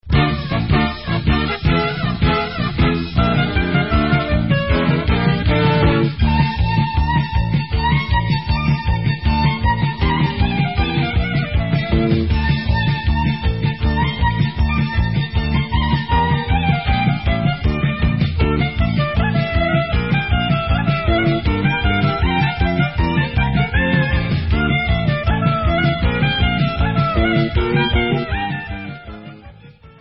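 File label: Instrumental, Israeli Dances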